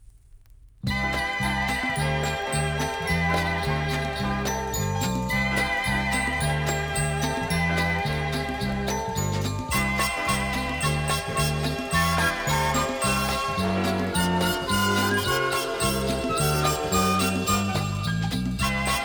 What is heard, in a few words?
jingle bells